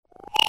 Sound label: ANIMALS